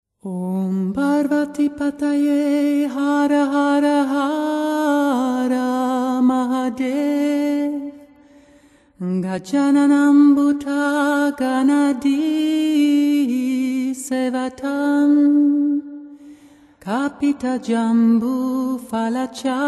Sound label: Gayatri Mantra, Ganesha Mantra